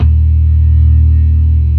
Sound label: ORGAN, NO PERC; C3; Keith Emerson Signature Series